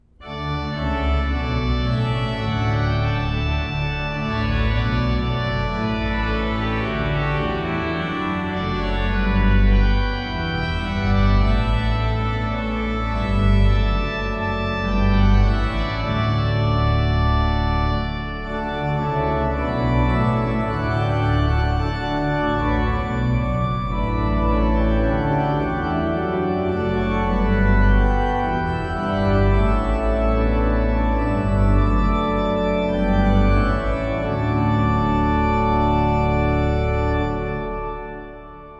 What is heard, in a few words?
Trumpet Tune in D